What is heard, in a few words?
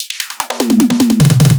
150BPM Drum Fill; Antidote Advent; Infected Track Drums Ableton Project